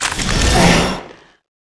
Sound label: King Spider Atk; Seer; GamigoNA